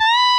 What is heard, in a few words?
solo bend full